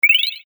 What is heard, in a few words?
roulette; LG GD900 Crystal; Game Sound